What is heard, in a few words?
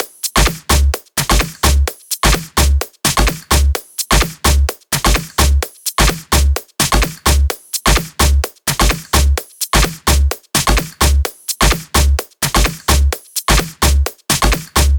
Dirty Dutch Kit Drums; Mutekki Media; Vengeance Electroshock Vol.2